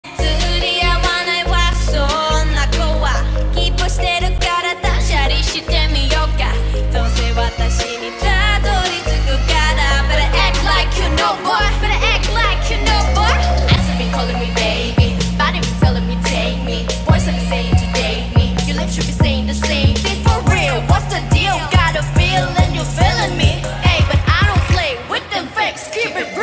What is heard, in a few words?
korean ringtone